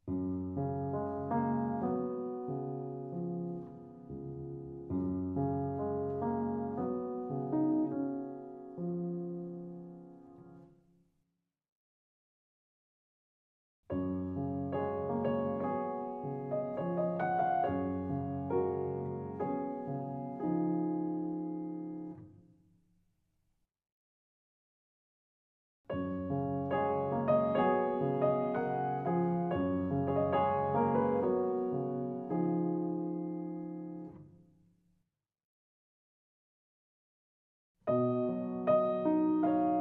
Underscore

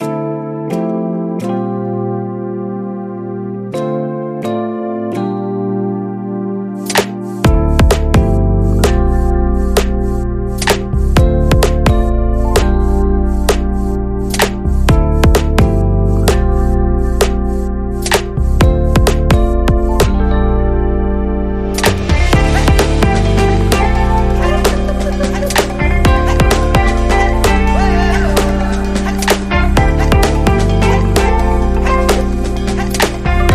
Electronic Latin Party

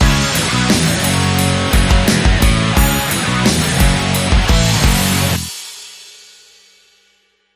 Pop Heavy 2, Sting

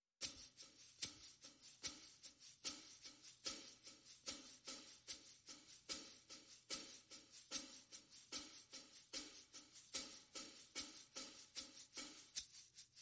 Perc Only

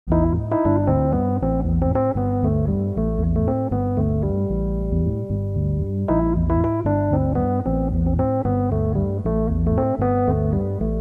Rhodes Song

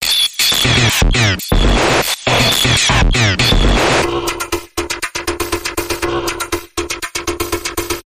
Screech Electronic Bumper

